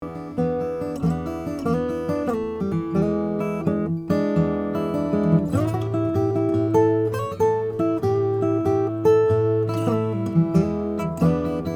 froggy bottom c deluxe all mahogany

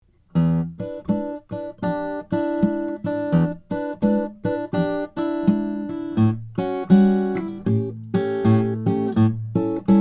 froggy bottom parlor with wider nut

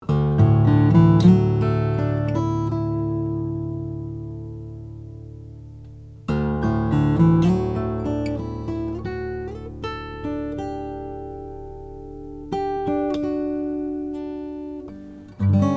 td heinonem om panama rosewood, guitar

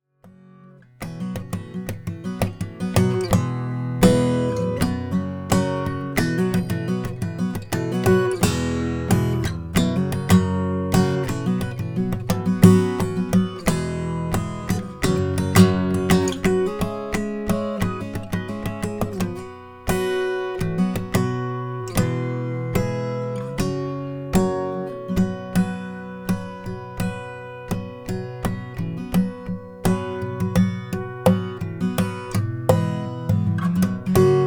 olson sj chocolate cedar top

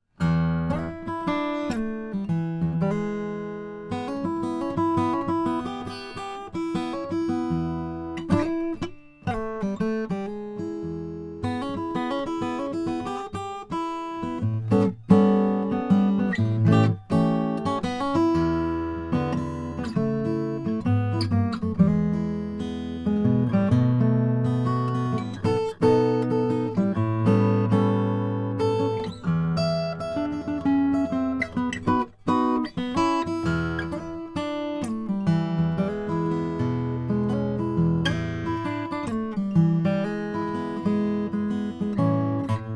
froggy bottom h12 dlx figured mahogany

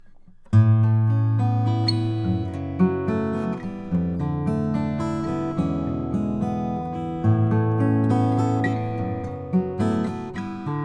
000 malaysian blackwood, Guitars Acoustic

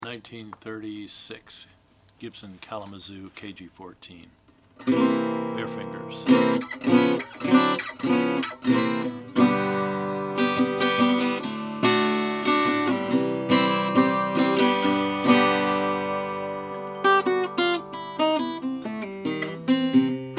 kalamazoo kg14 sunburst; 1936

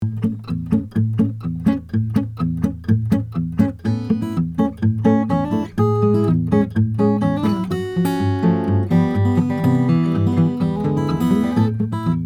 gerber sj galaxy wenge

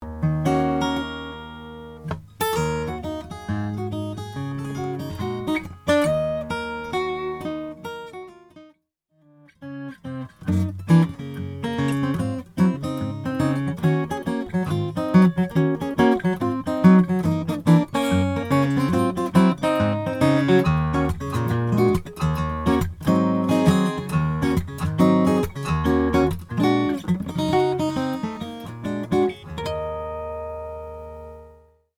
rainsong s dr1000nr black graphite

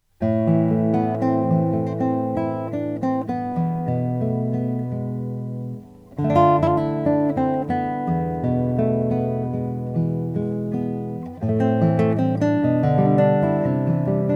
lowden s35j madagascar rosewood

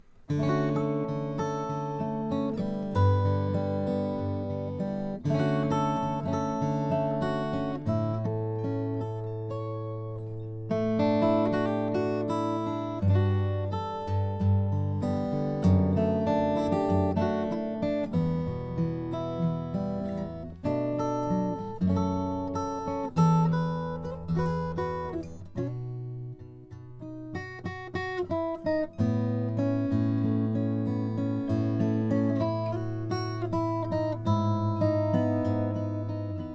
langejans kr 6 cutaway 5a koa

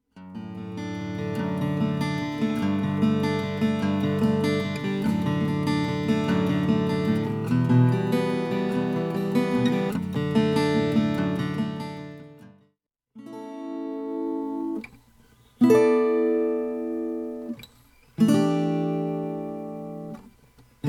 2012 flammang parlor p30 adirondack top

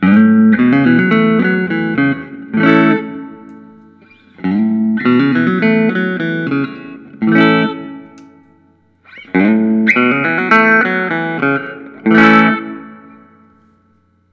collings 290 single cut tv yellow

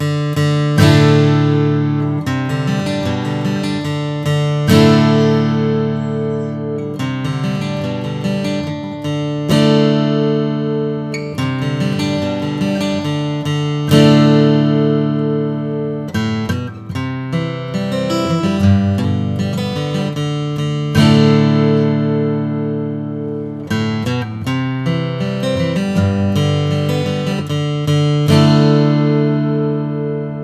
collings d2h adirondack natural

